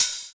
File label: Open Hhat, Hihat; Equipped Music; Loungin House